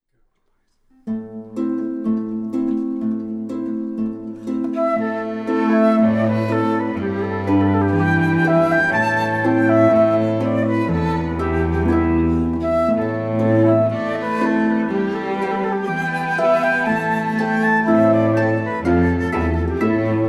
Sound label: Celtic tune, string quartet